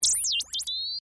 Short Wave Vintage, Scifi, Short, Wave, Vintage, Scifi, Sweep; High, Pitch, Science, Fiction; Communication